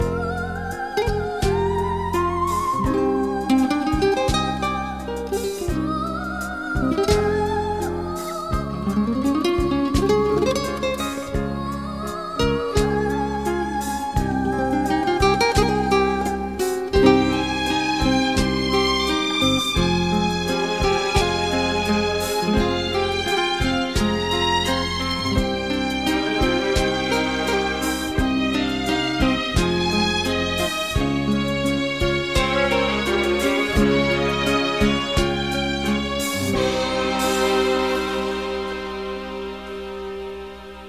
Saxophone

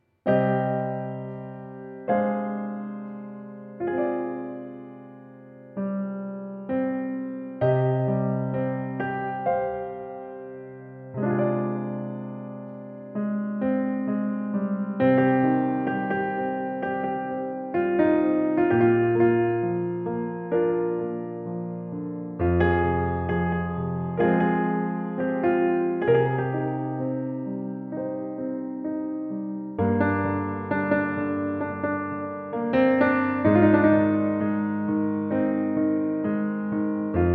Piano

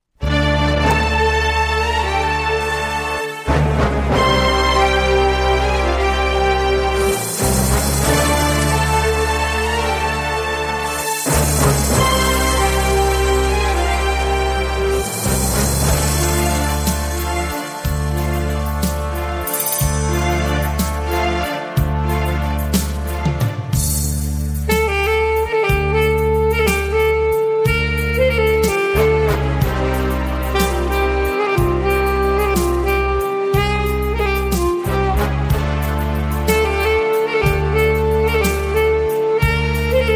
Saxophone

